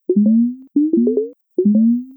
Oxygenation, alarms; J Edworthy 2019

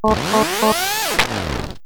Failure; J Edworthy 2019

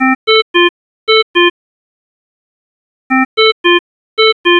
venthi, alarms

